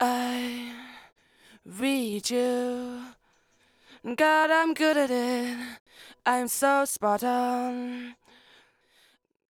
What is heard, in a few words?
Lead Vocal Verse I; Multitrack